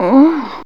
SEXVOX; X-Static Goldmine 1

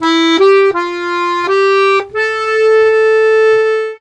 Accordions; USB Soundscan vol.40; AKAI

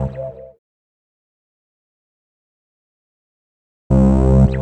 Bass Synth; Sonic Foundry (Sony Creative Software) - Stylus Pressure Urban Grooves on Digital Wax; G Forces

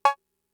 Cowbell; Fricke MFB-522

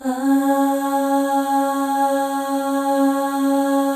AHS, FEM; Spectrasonics; FEM.2 AHS

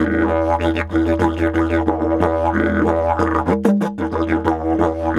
Didge 93 BPM, Didgeridoo

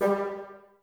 BNS G, Bassoons, Wood Winds; Miroslav Vitous - Woodwind Brass Ensemble

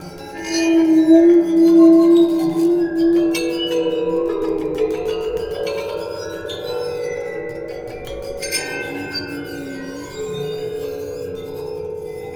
Waterphone Bounce Bow; Sonic Foundry (Sony Creative Software) - Ambient Atmospheres & Rhythms; Ambient-Metals

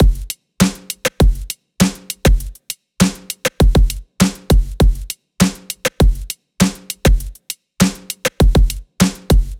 EDM Drum Loop 100 BPM; Dance; 99Sounds Music Loops; 99Sounds x Monosounds

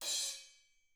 ZILDP MALLET; ILIO; Double Platinum Drums 1

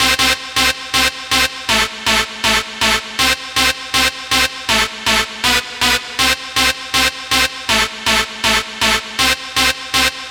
Dance Synths, Hardcore Loops, Synth Loops

